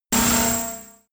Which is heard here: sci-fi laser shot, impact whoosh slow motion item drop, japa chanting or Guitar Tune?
sci-fi laser shot